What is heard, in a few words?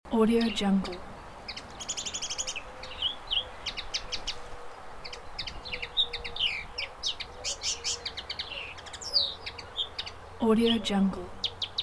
spring birds on windy village loop